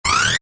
Maximise, Effect To Accompany Maximizing Of Window Or Program; Transitions; Multimedia; Stuart Duffield